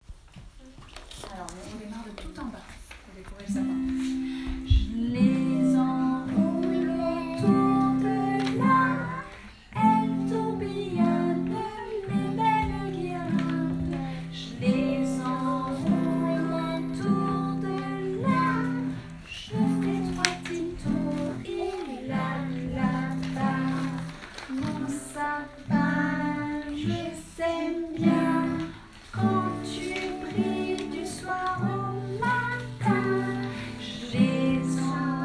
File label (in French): les minis chantent; FLAM